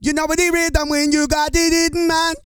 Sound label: REGGAE, Vocal Planet World Voices